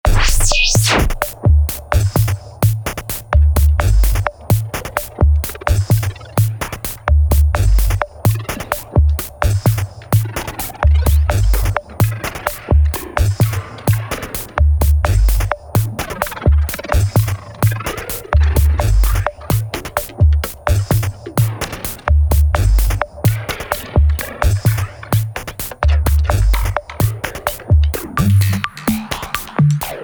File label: processing wait music